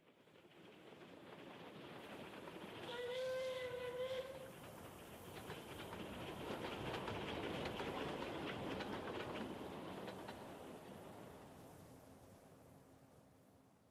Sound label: Cowboy Ambi; Pitch Black Audio Pong